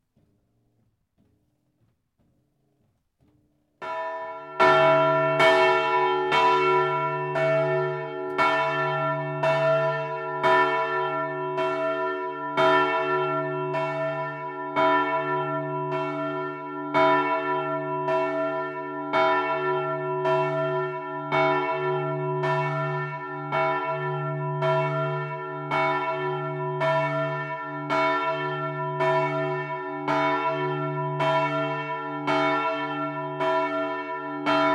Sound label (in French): cloche; Knutwil cloche-1; Assemblée générale 2013 de la GCCS à Willisau (LU)